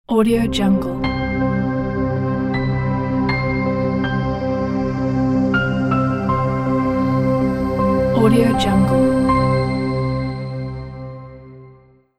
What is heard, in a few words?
opening logo; okanakdeniz